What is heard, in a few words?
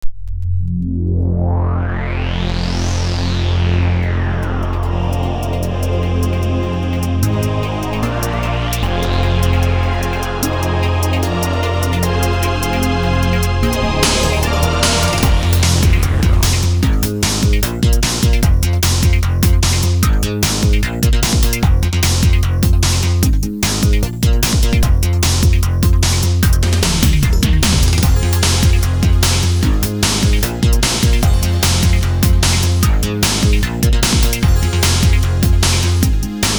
Roller Disco (Disco Train); Donkey Kong Country 2 Diddy's Kong Quest Serious Monkey Business; Zylance